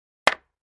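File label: 2x4 Hit Hammer On Cement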